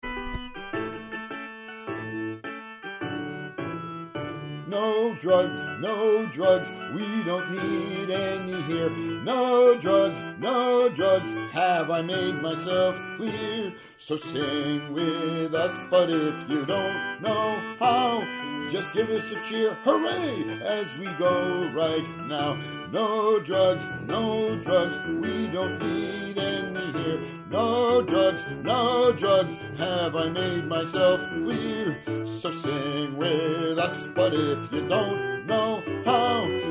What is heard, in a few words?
vocals